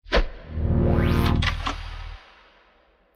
Level Up; Twist of Destiny

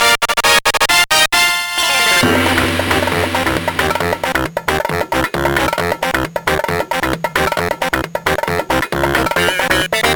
Last Lap; Banjo-Pilot; Hailfire Peaks-Grunty Industries-Steamy Vents

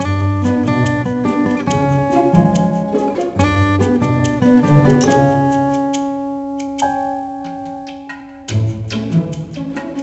Jazz

